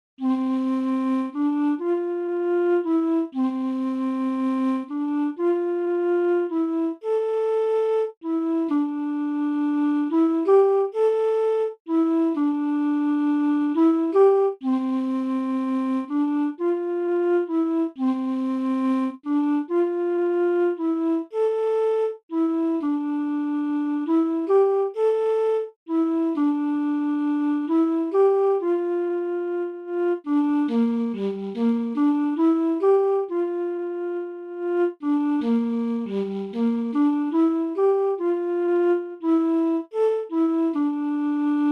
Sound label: Alto Flute Solo